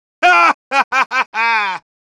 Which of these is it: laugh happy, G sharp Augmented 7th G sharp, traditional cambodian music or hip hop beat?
laugh happy